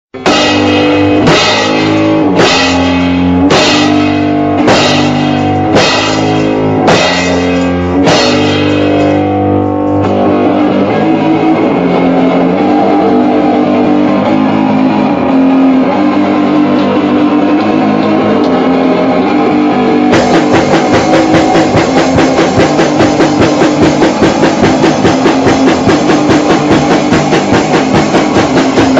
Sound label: Nepali Metal